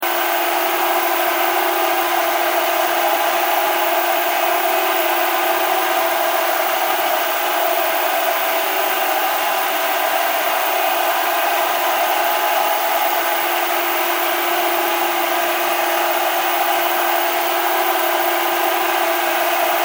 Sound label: Technology Television Static; Electronics